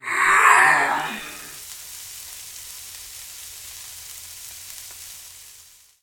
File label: frying; anna